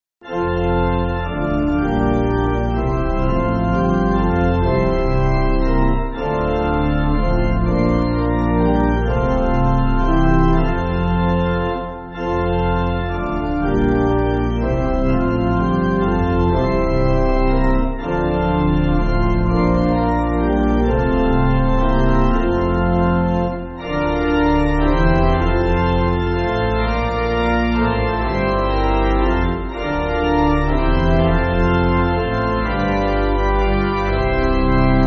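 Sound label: Jesu Source Of The Life, pipe organ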